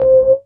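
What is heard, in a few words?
ORGN -L, organ, Drum & Bass, 165 BPM; Ueberschall; Drum & Bass Resonance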